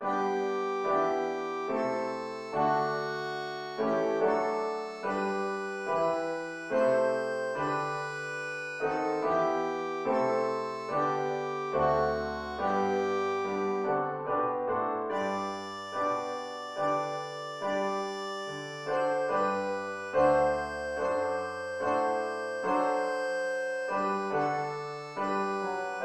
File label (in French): hymne national anglais